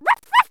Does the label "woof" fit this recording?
Yes